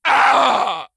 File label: pain zombie; cstrike